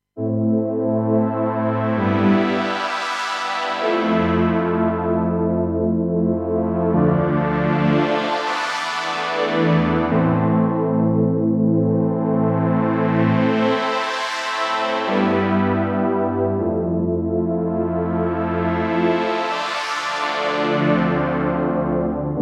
Modulated Pad

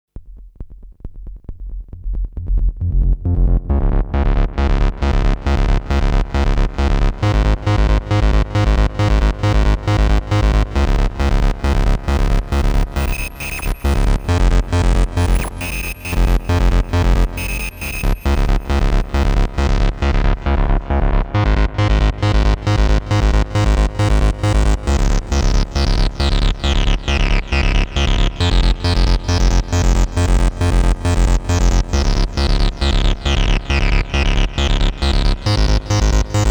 Sync Filter Bass Dry 2 Wet

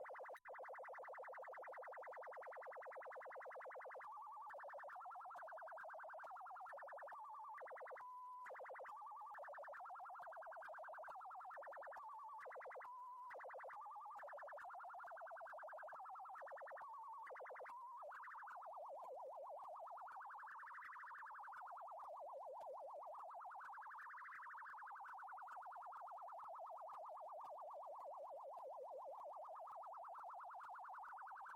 Boss DE-200 Digital Delay LFO Hold

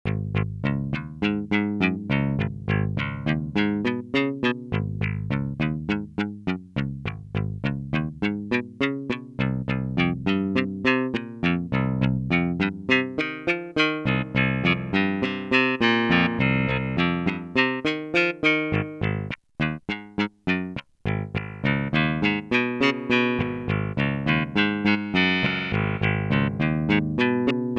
Intellijel Plonk Guitar Intro

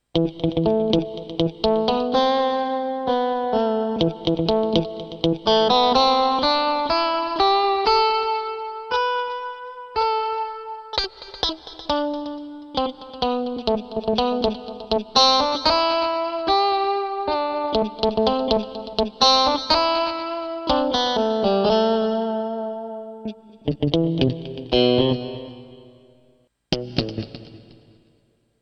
Guitar; PA3X DNC

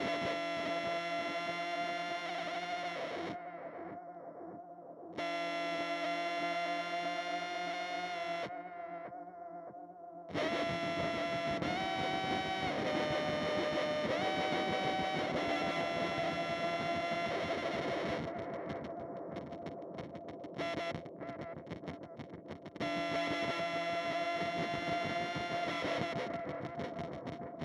Pigtronix Constellator Space Rip

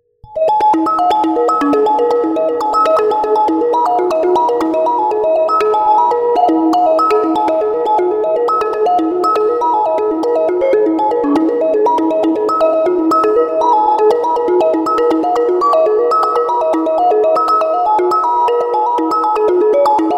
Steampipe Spiralism

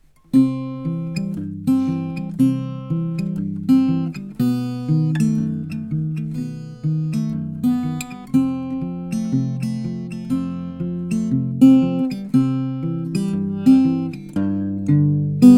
Harley Benton TravelMate E Mic Picking

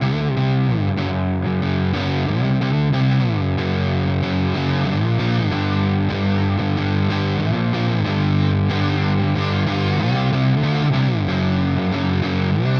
Ripped Speaker Fuzz Saturated and broken; EHX

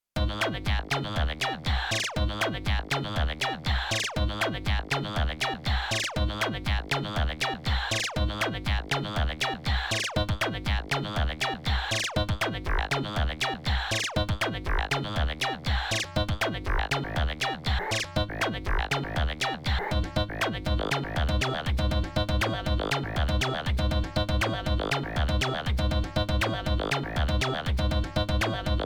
TE PO 137 Jam

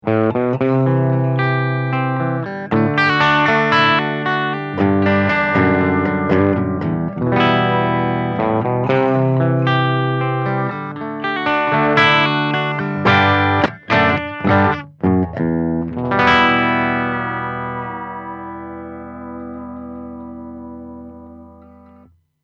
Clean

